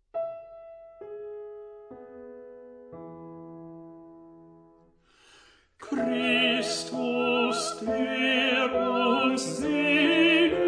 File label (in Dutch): tenor zang; Christus der uns selig macht